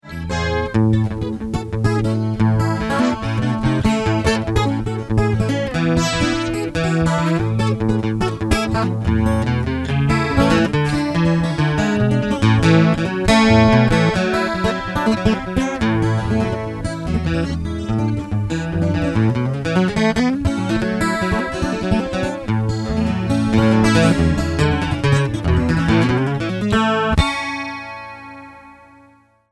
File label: fingers